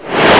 gunshot

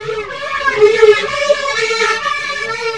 ambulance

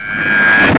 ricochet

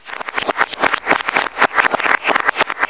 clap

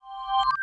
chimes

